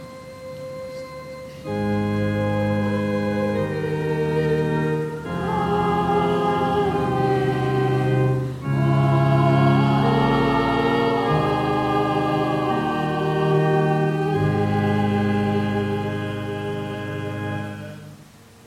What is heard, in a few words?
3 fold amen